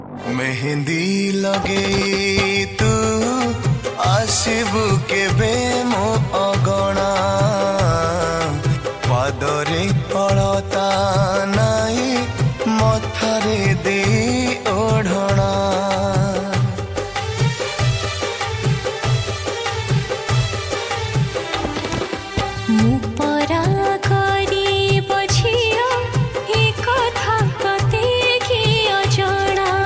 Odia Song

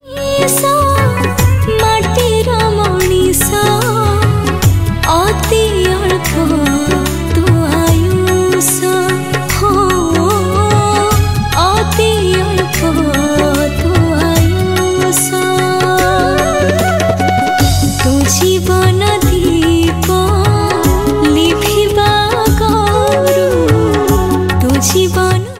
ODIA CHRISTIAN SONG

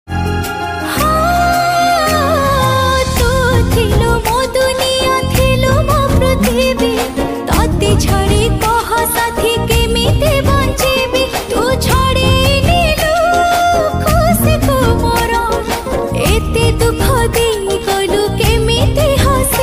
Female Odia Sad Song

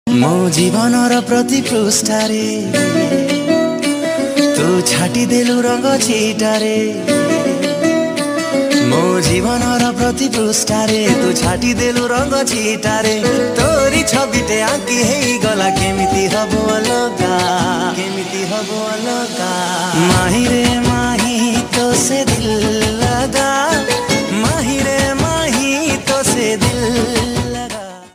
Odia Romantic

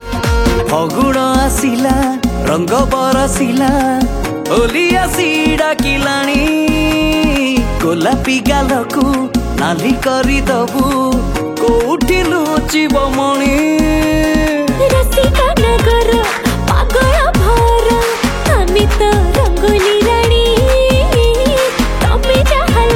Holi Song

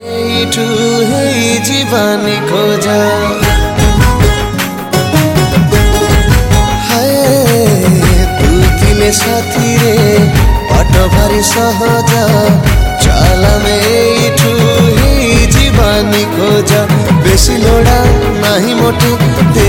New Odia Romantic Song

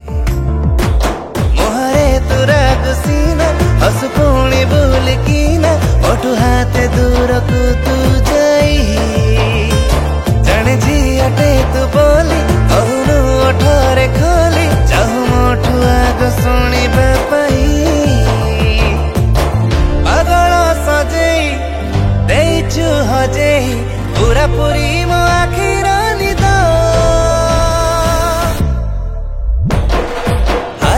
Odia Romantic Song

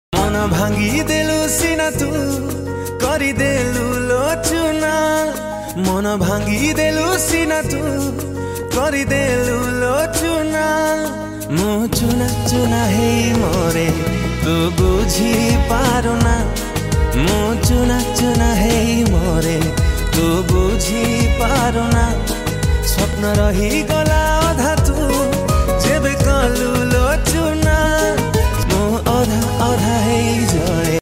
New Odia Romantic

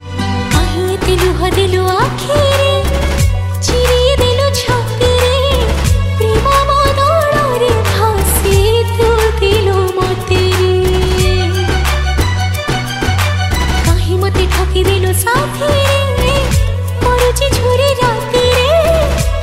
Odia Sad Video Song

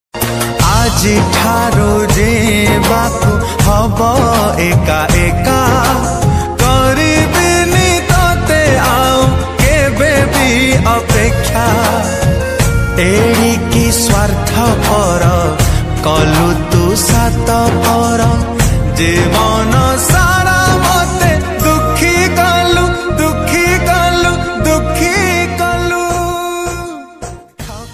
Odia Song Ringtone

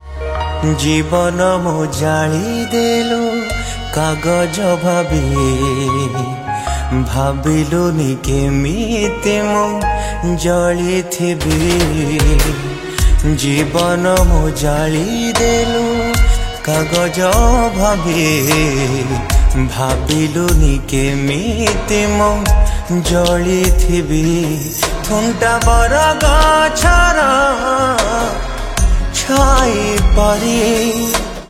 Odia Sad Music

